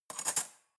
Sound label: cutlery fork drawer handle grab